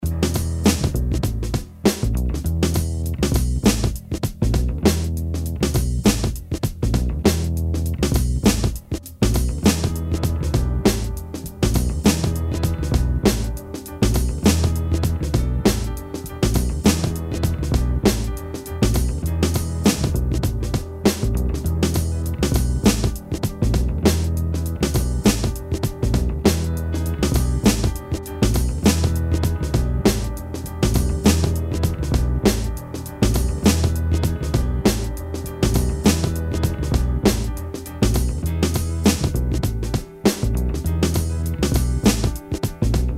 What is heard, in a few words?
Dark Piano And Bass